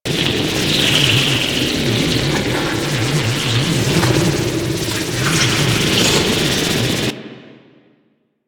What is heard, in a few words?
Exterminate Hive Shell; Ambience; Warframe; Infested Eris; Encounters